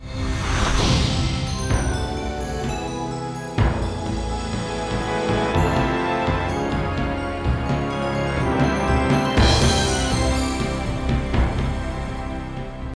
Logo; Time Warner Interactive

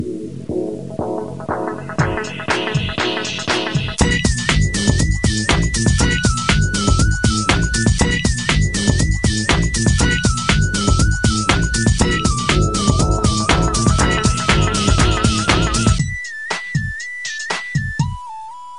Attract Mode Music

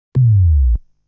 Coin insertion sound; legend of hero tonma

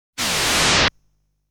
moving sound; legend of hero tonma; Big Satan (last boss)

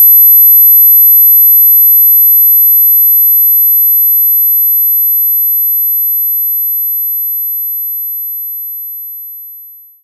Sine Triangle 12000

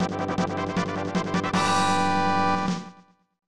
Begin Battle; super trump collection; snes; Spit

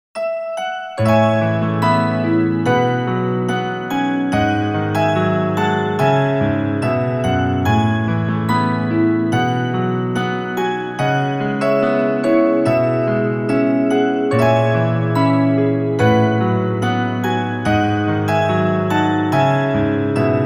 piano ver.; Jewels Ocean

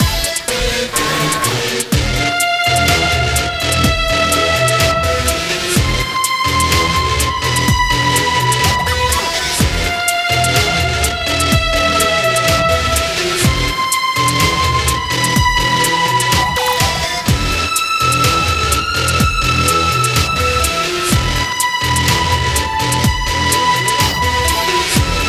BGM Occasion

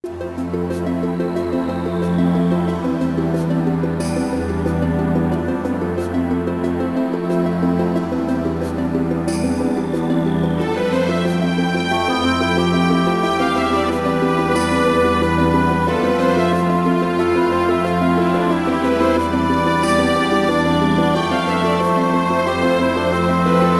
Forest Cave (Without SFX); Dokapon Kingdom; Wii

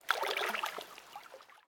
lake; Level2 Ice Fields

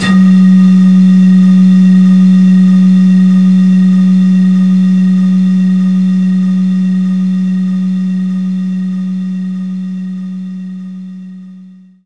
Bottle Blow

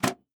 motherboard Slot

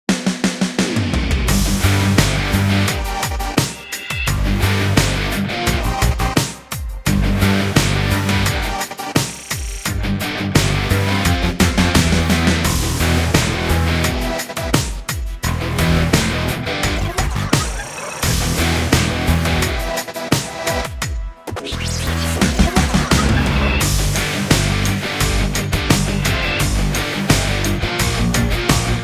music ingame; bulldozer inc; android game music

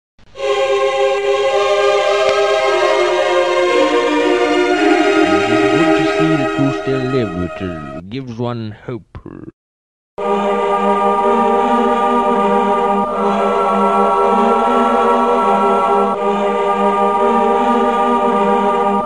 Church (Kinda Loud); The Town with No Name

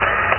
Bug Swit; Buggy; PS1

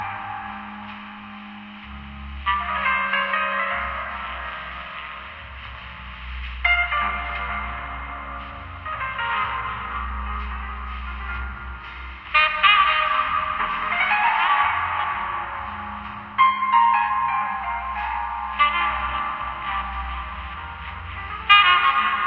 Elevator Music; Shadow Warrior 2 gamerip 2016

